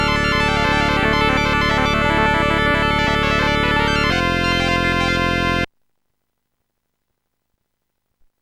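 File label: contemporary short; Instant Music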